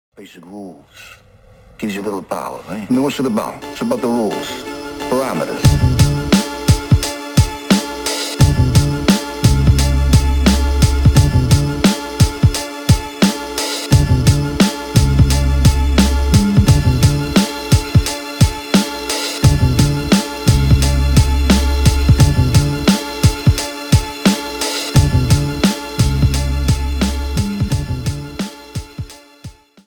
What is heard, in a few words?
Power INSTRUMENTAL